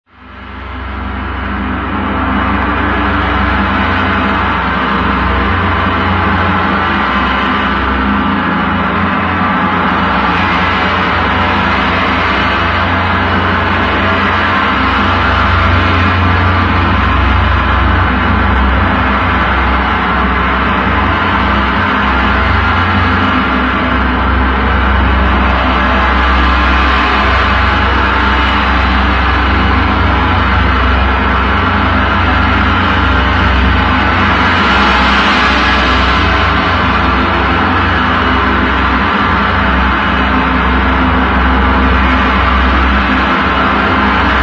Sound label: two gongs